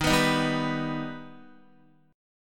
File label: Eb Augmented, Guitar; Eb+ strummed; x,6,9,8,7,6; JGuitar